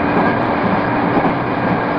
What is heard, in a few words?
Run, Train; OpenBVE